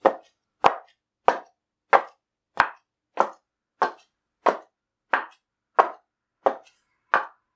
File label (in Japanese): geta